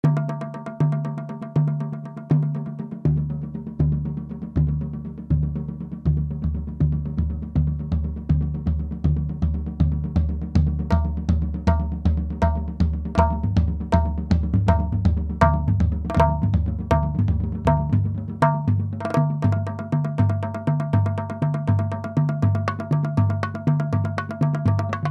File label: elastic bongo